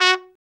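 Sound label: BRA TRUMP, trumpet, brass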